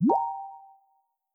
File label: redirect; Microsoft Office; LYNC